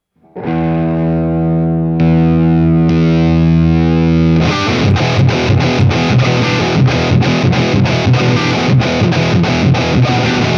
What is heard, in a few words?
Boost OD